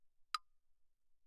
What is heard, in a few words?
clave, hand percussion; claves